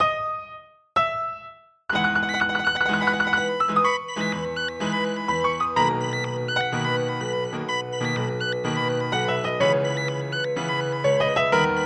pad

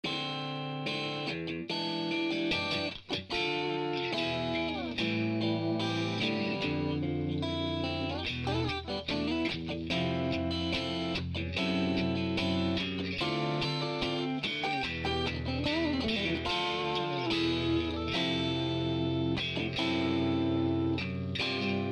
ballade

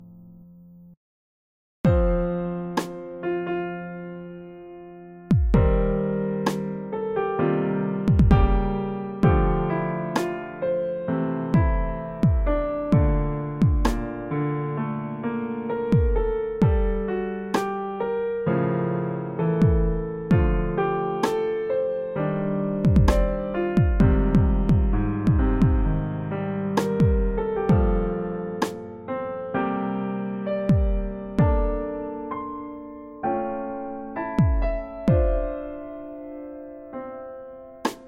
drum

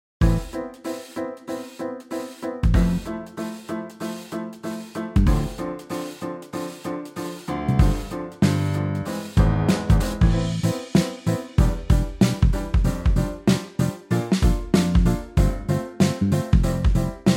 drum bass

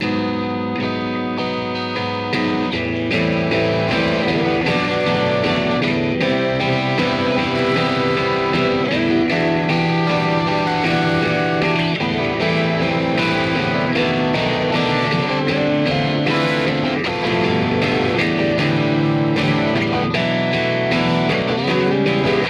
guitars before

